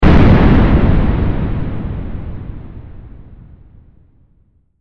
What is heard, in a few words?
Explosion; Rei Yumesaki